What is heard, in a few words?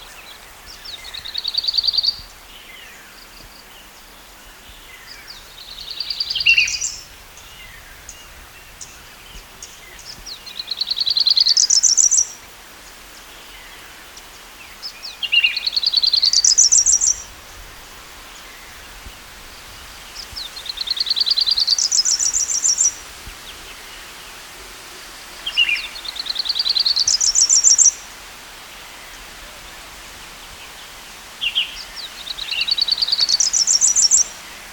Grey breasted Prinia Prinia hodgsonii